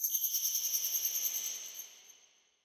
Under City Bat Chatter Distant

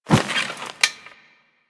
Classroom Chair Sit; Ambience; Warframe; The New War; Quests; Zariman

